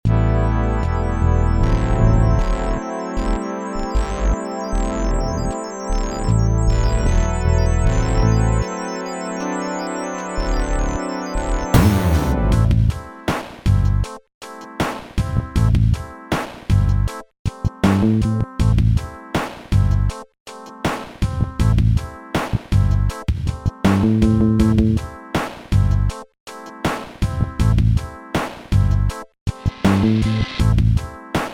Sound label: Sega Genesis Instrumental; WWE; SupremeMIDI